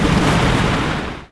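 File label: Ambience Water Exit; steel saviour reloaded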